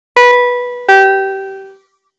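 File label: division bell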